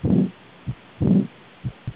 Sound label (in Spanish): insuficiencia aortica